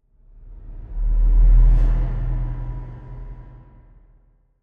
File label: strange echo; pirate mysteries android ios macos mobile windows gamerip 2012